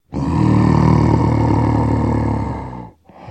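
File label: Monster Growl Dinosaur, human vocals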